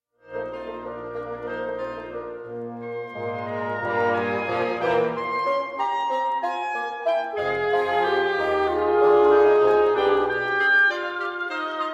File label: septet in E flat major; op 20; 6th movement; andante con moto alla marcia; presto